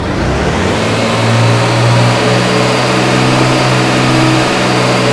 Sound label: v8 turbo on loop; ratmobile; vehicles